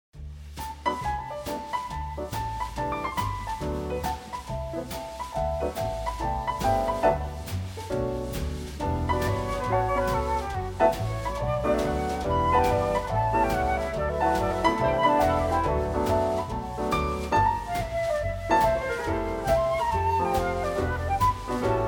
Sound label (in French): orchestre